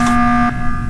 alarm emergency; ze amus b5

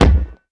ball impact ground

